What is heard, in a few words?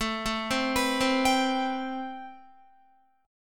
A-Minor 9th A Guitar; Am9; arpeggiated